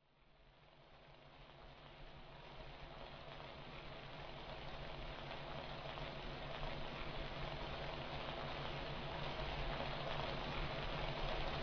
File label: rain